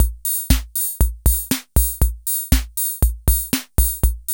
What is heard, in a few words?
ROCK; INIS - Funky Xtreams; KR55 LP4